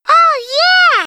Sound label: hunter pounce; catnap n grabpack; elmo